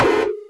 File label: IND; Zero G; Man Machine